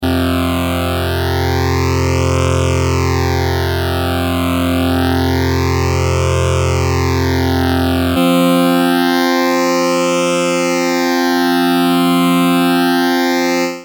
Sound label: prodigy phase 100